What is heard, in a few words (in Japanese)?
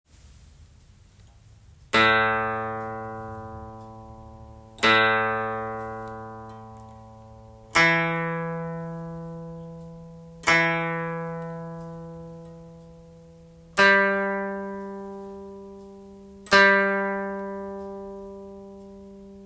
神仙 C, 箏 調弦; A E G A A# D E G A A# D E A